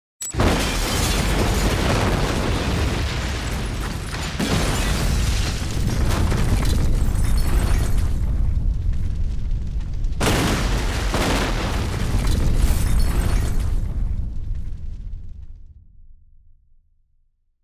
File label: Explosion